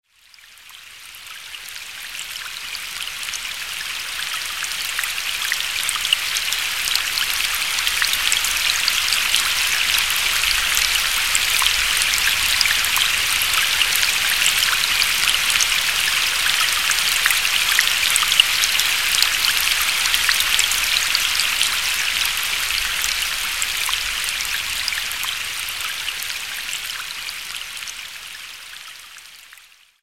steady rain; sound oasis

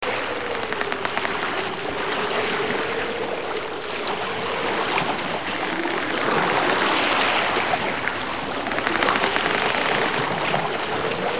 Harbour Swell, Ocean; Ocean Escape

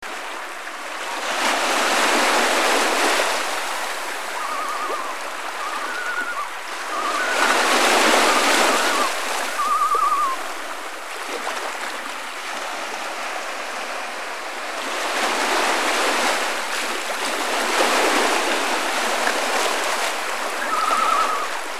Loons on Wilderness lake; Wilderness Retreat

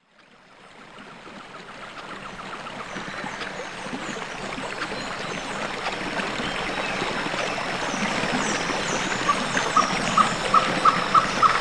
rainforest; sound oasis

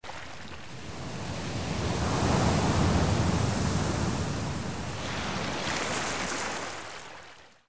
Hidden Cove

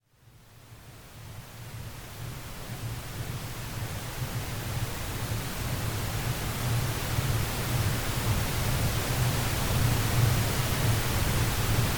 pink noise with delta; sound oasis

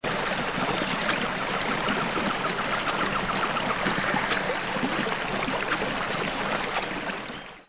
Rainforest

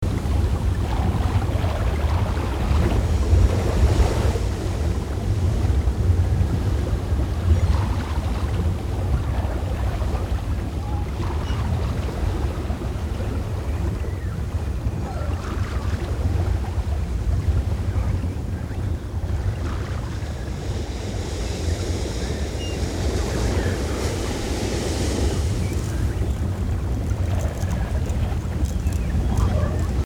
DOLPHIN

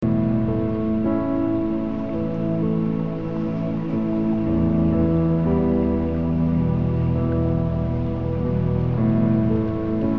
LULLABY

